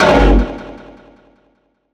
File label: impact oneshot; GhostHack